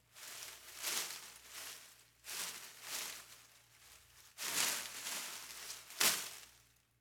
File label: Rustling Plastic Bag; Fairlight Sound Lib